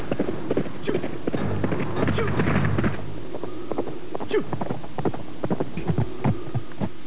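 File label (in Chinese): ma jiaosheng, horse neighing; jiachu jiaosheng; jiaqing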